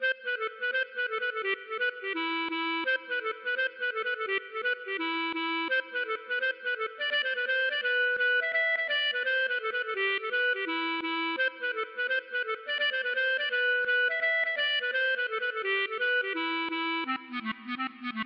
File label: gavotte; Coateval